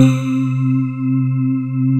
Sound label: COOL WAVE, PPG WAVE; Rubber Chicken Software Sample Bank